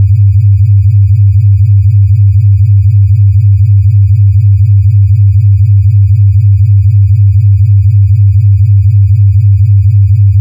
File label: inside ufo